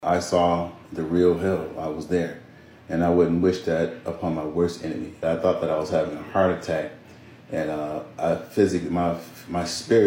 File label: demonic music; SAW HELL